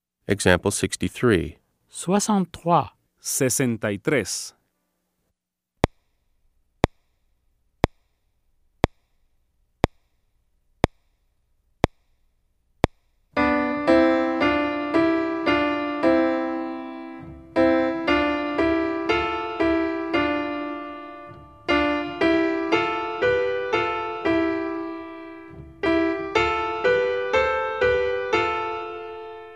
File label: Keyboard